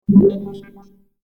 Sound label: Warped Water Electronic Bubbles; unusual sfx